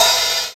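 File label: cymbal RZ1; Best Service Gigapack 2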